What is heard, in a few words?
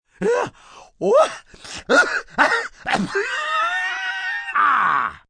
Sneeze Wheezing, Sneeze, male, wheezing with cough, long, drawn out, cold, sick, (human voice); Sneezing